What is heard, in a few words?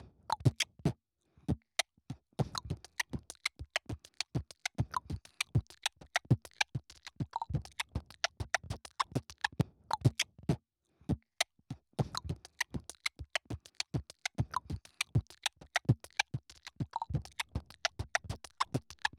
bbox